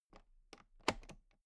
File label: toolbox latch open loose clatter; industrie wirtschaft; handwerk